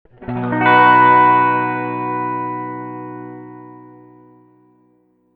reverb tank 3 spring short sustained chord

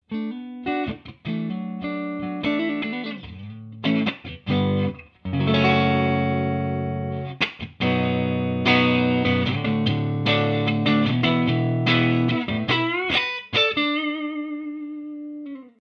clean

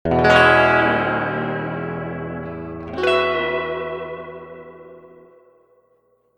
reverb tank 2 spring short tremolo chords

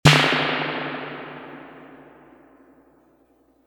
reverb tank 2 spring short drum machine snare

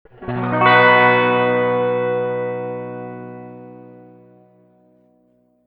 reverb tank 2 spring long sustained chord

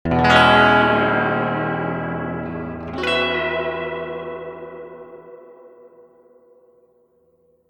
reverb tank 3 spring long tremolo chords

